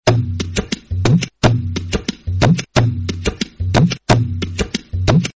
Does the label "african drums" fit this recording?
Yes